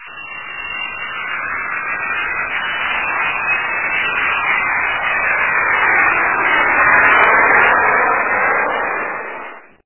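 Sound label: noise of a low-flying plane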